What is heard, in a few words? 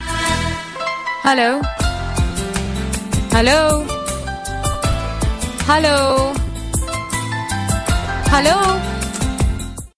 Hello Hello Indian Female Calling Hindi Song Style